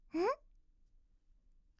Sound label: system notification